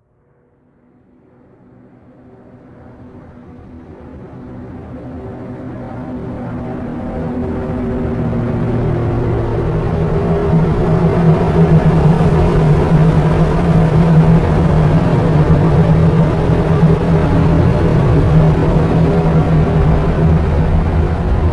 Trench Plane; Pocket Dimension; Room

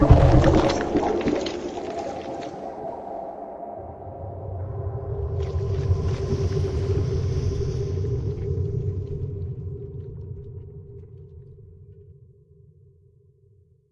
Sinkhole Fall

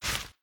Pick Item; Interact

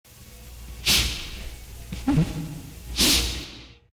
Sniffling; LureSubject

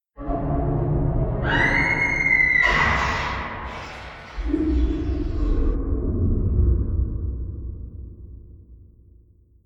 Scream, Scientist

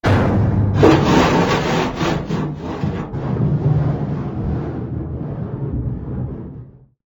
Vent

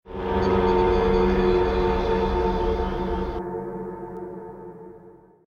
Chase; SCP 860

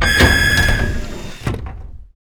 Door Checkpoint

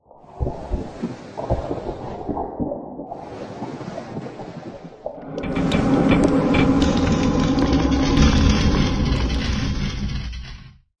Enter, Pocket Dimension; Room

